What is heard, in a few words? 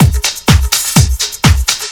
drm 125, drumloops; house; PS-28 Vocal House Samples; stompdaperc